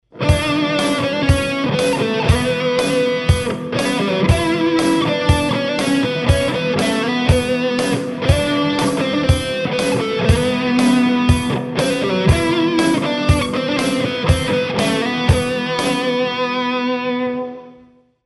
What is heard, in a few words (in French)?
Mode Dorien / Eolien, Exercices de Guitare